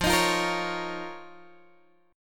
F 7th Suspended 4th Sharp 5th F down Guitar Open G